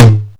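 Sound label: Tom Kit high tom; classic machines; SCI Tom Kit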